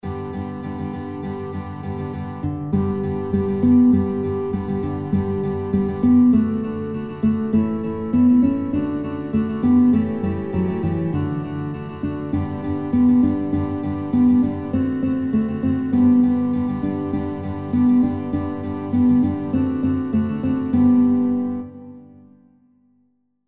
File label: Guitar, kinderlieder gitarre playalongs; Guitar Songbook; Zeigt Her Eure Fuesse